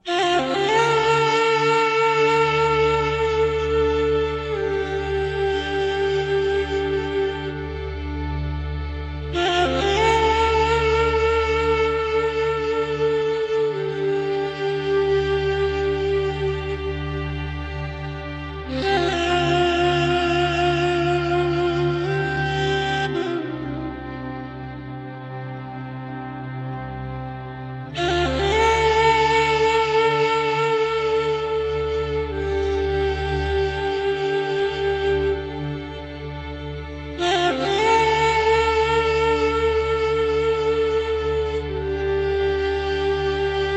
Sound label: Ney